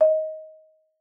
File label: MARP D#5, MARIMBA; Xsample VOL-4